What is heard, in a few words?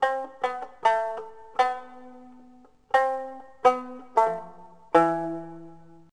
Shamisen